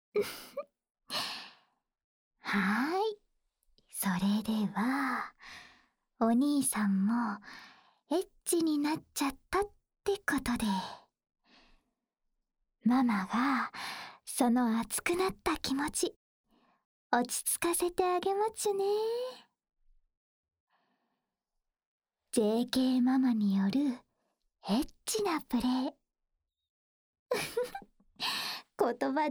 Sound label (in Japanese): フェラ; JKのフェラテクで、骨抜きにしちゃいまちゅねー♪